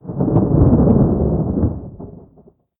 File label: lightning storm rumble